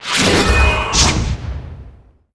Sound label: Missile; Gamigo